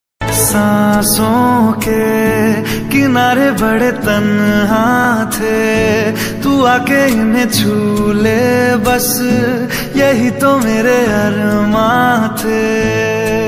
sad ringtone heart touching ringtone broken heart ringtone